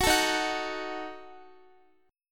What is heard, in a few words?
D sharp Diminished, down, Guitar; strummed